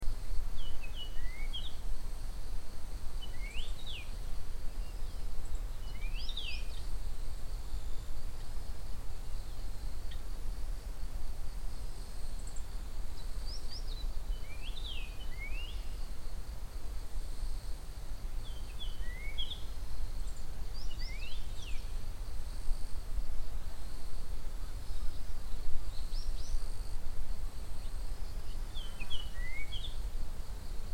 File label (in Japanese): イカルの声です。; 散歩の途中で聞こえるイカルの声ですが、なかなか近くまでは近寄れず大きな声の録音ができません。